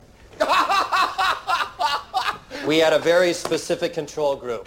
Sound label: Wahahah; Dr House